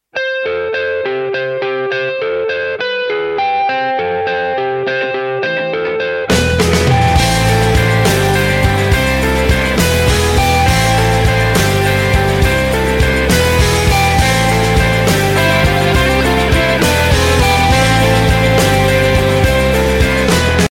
nice ring, ringtone

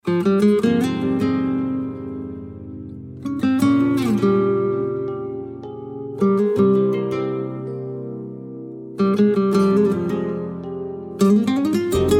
calm arabic guitar

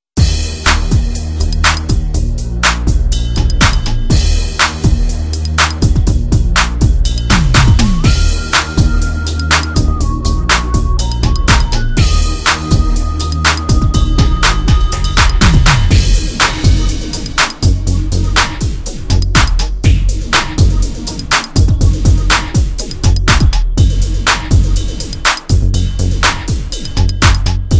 funky soul beat

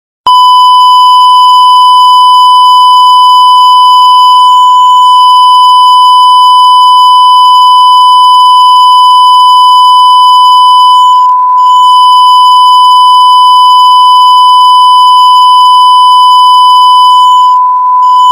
annoying beep

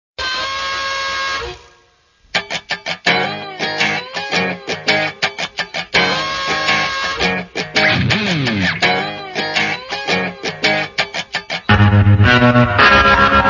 cowboy music

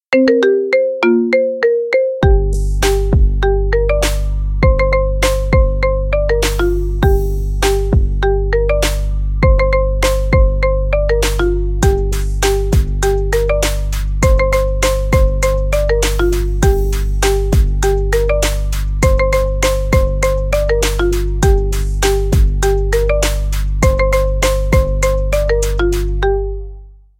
marimba remix

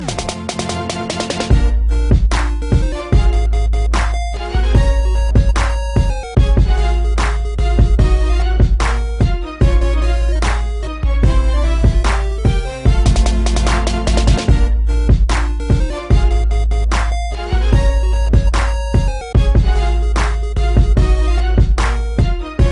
beat; Legend of Zelda

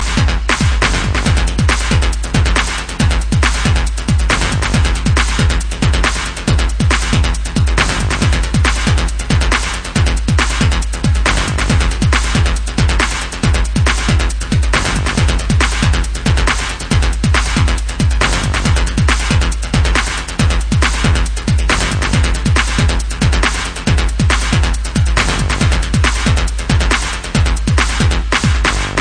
techno

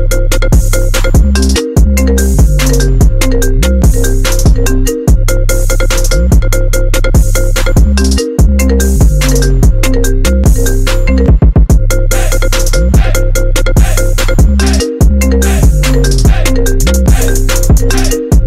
iphone

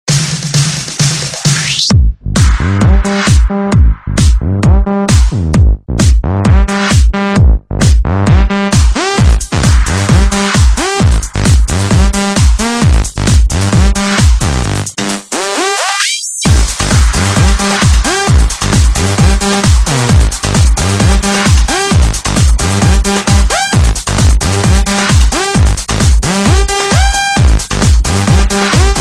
electro funk

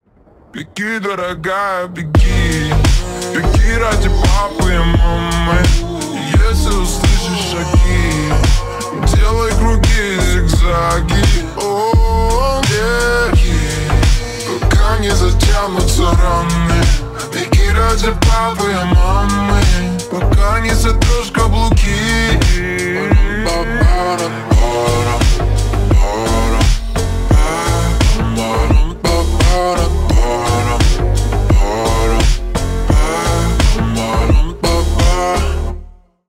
slowed remix

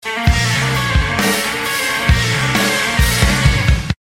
scary guitar sms

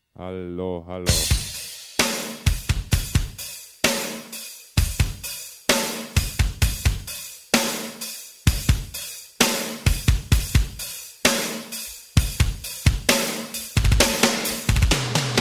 hello riff

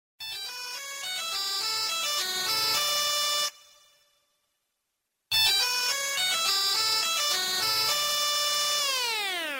mono nokia

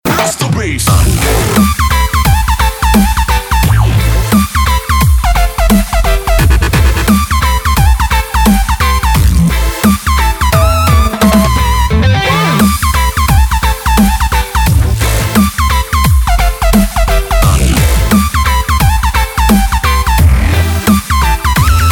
rasta bass

